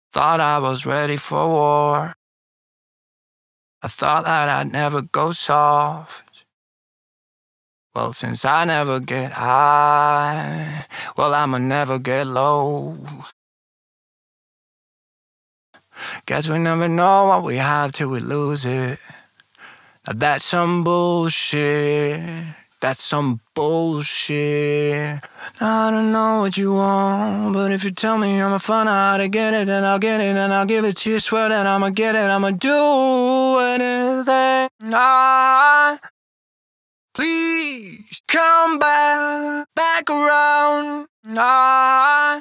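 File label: hip hop sing 92 A minor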